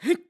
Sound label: Attack; BOTW; Breath of the Wild; Link